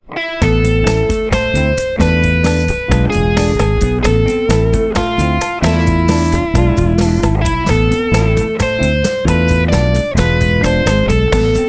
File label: harmonic minor, guitar